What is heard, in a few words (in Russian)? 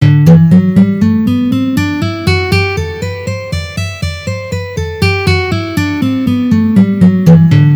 Gamma Sol mazhor pozitsiya